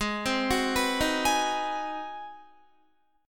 G sharp 7th Sharp 9th, Guitar; G#7#9; arpeggiated; 15,15,18,16,15,16; JGuitar

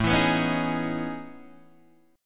A Major Sharp 11th, Guitar DADGAD

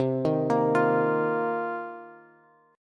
C Minor 11th C Bass Bass Standard; arpeggiated; JGuitar

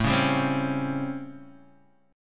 A 7th Sharp 9th, Guitar, Open D; 7,4,5,6,4,x

